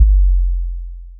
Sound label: Kick Sine Tone